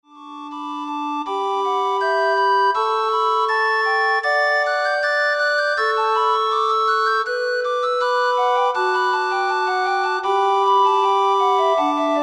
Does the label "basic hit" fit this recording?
No